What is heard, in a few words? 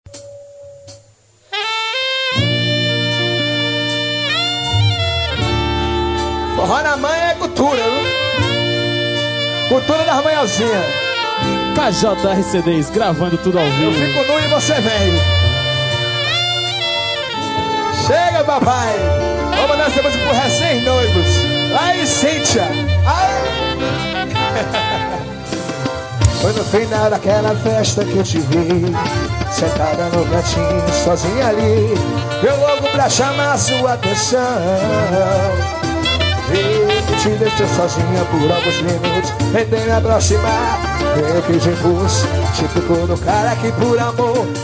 forró